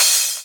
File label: soft hit finish